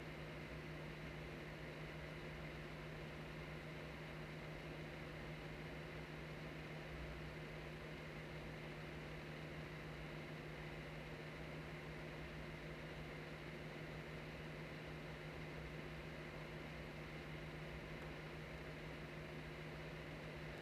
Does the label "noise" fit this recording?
Yes